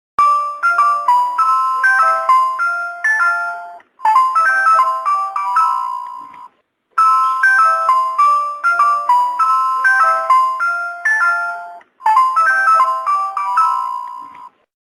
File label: Music Box